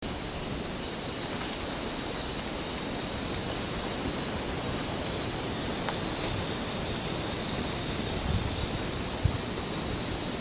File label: scissor tailed hummingbird